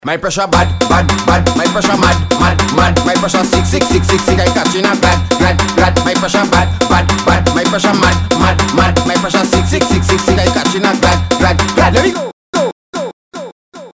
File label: soca ringtone